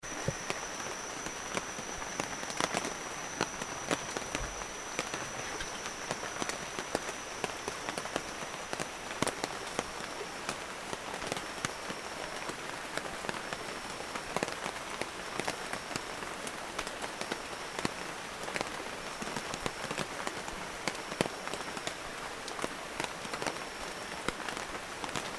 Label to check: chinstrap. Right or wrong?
Wrong